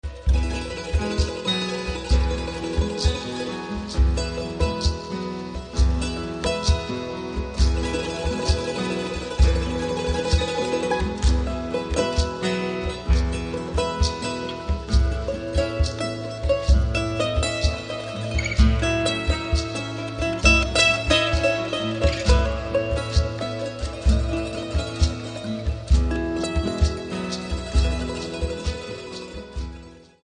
andean

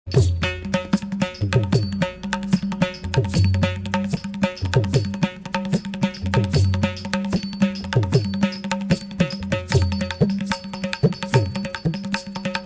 southern, drums of india

